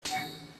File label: notify